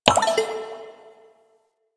Messenger Window Close; Ambience; Warframe; Nineteen Ninety Nine

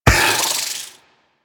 Proc Poison; Mods

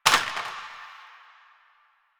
Plastic Drop; Warframe; NineteenNinetyNine

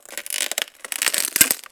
Ocean Glass Crack Small; Ambience; Warframe; Grineer Ocean

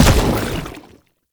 Step Default, Footsteps; Warframe; Lasrian; Lasrian Soldiers; Foley; Lasrian Chem Strike Nox

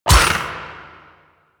Melee Hit; Warframe; Voruna

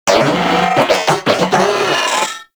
Vocal Idle, Enemies; Warframe; Moa

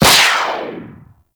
Skate Dash; Warframe; Corpus Modular Crewman; Skate Movement

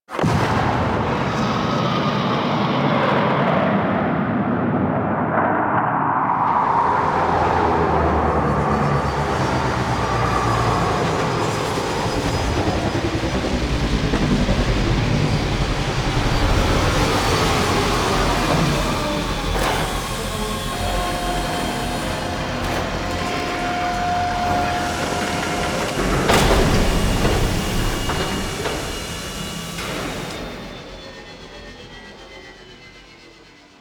Rail Jack Summon Ship; Warframe; Rail Jack Dock

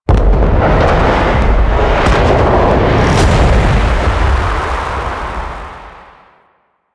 Void Dragon Death Explosion; Enemies; Warframe; Duviri

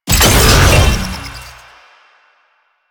Research Pod Stop; JadeShadows; Ascension

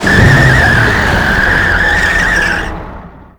APC Move Left Circle; Enemies; Lasrian; Warframe

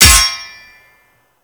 Parry Sword; Warframe

